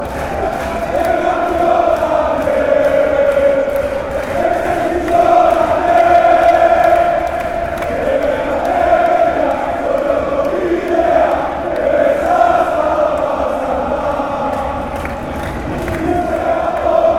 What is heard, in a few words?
chant, panathinaikos, fanchants